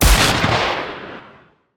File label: shotgun firing; PUBG